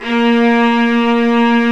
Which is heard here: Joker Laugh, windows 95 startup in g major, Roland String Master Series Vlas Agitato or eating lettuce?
Roland String Master Series Vlas Agitato